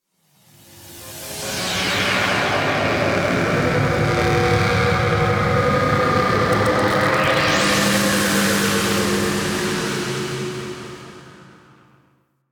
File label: Exterior Cloud Probe; Ambience; Warframe; Railjack